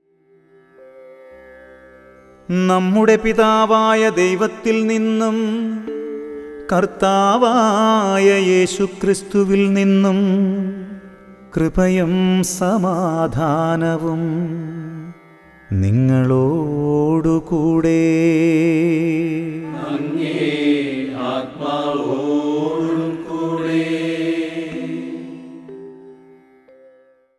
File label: Ragam; Nammude Pithavaya; ordo cantus